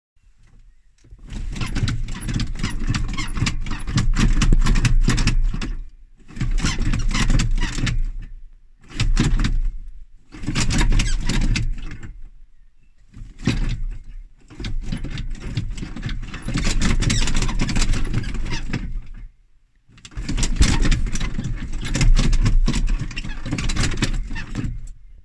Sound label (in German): Schlagloch; Modulkoffer